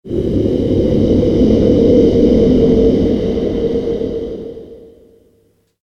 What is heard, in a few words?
breath dangerous, kreaturen; comedy-cartoon; soundpool